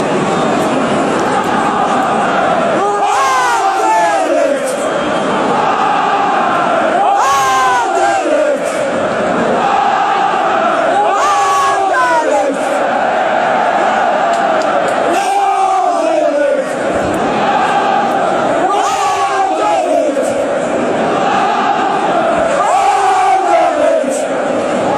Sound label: aaaaaanderlecht, fanchants